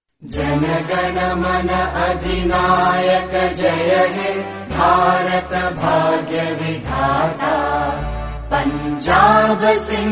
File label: National Anthem Original; Jana Gana Mana